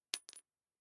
Dropping Nut And Bolt On Concrete Metal Rattle Ring Single